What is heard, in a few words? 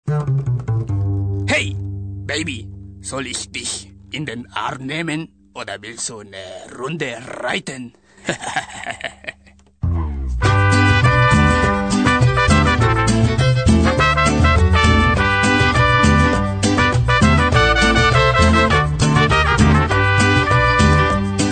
guitarron